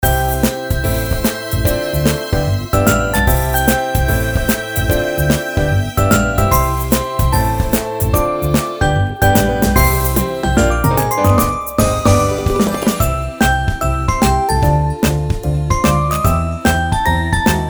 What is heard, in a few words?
jazz